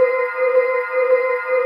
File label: Scifi Synth Tone Loop; SFX Kit; Sci-Fi Loops